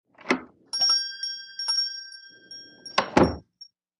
Shop Door Bell

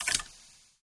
Robothead Mechanism Small Multiple Hits

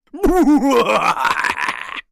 laugh evil male

